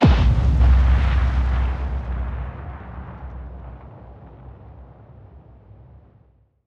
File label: nuclear explosion; fight